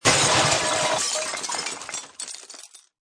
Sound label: Junk Crash, Glass, metal, debris impact and rattle; Good stereo definition.